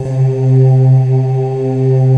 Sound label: AHH PADS; Pro-Rec - Synthsonic